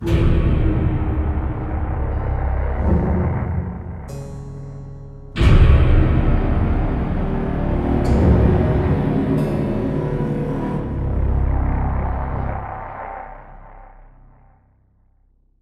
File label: Music stinger; Hunted The Demon's Forge; 2011 x360